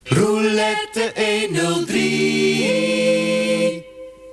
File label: silence